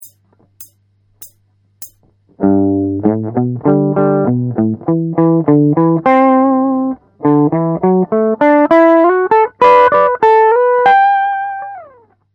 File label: blues